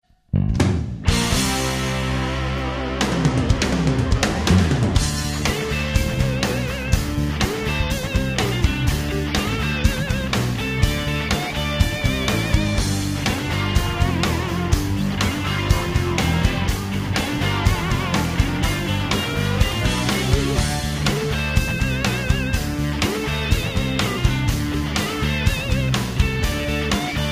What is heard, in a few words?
Rock Track